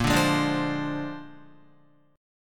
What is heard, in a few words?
Bb 9th Bb down Guitar 4F,4A,5C,5G,5C,6E; strummed